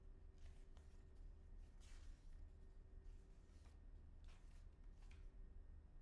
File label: pageturn; IEEE AASP